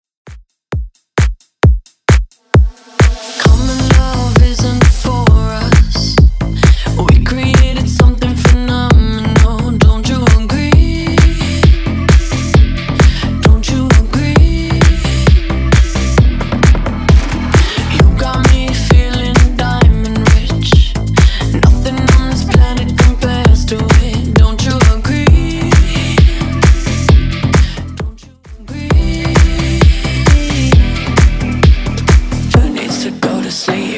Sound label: Top40 Clubmix 132 bpm